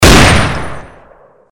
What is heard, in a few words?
Deagle